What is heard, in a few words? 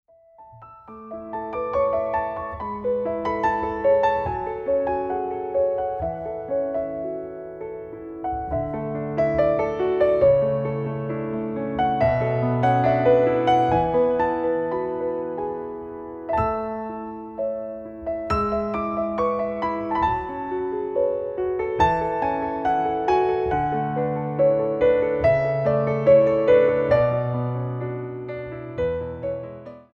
Naked Piano